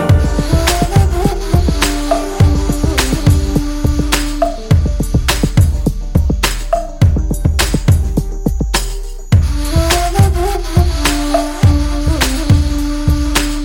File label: Beats Telugu Ringtone; Panjaa